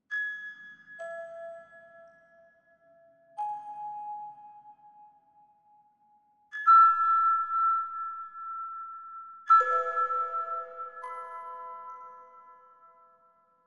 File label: Creepy Music Box